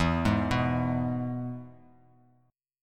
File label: E Major chord; arpeggiated; JGuitar